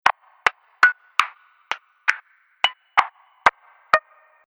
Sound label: Hit Pack 10 Hits, impact sound effect; Miscellaneous Impact Sound Effects